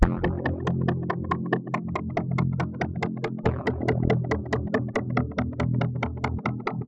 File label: Synth Poly